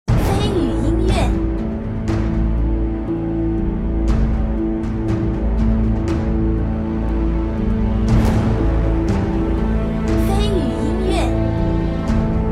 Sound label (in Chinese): 史诗-磅礴-悲伤